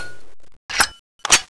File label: firecracker; weapons